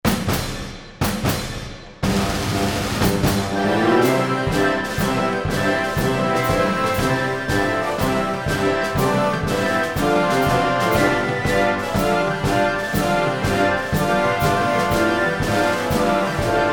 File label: Instrumental; soundtracks; music composed and written for a variety of short films